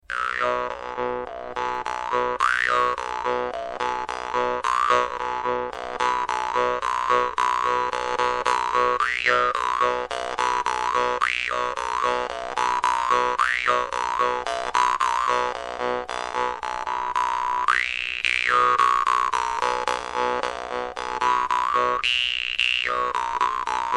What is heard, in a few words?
cat eye, vargan; doromb